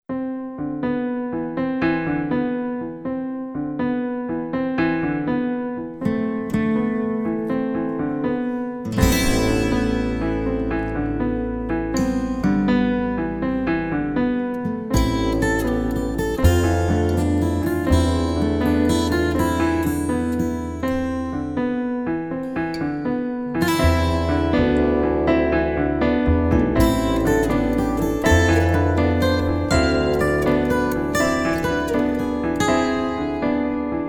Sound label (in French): guitare; pellenerie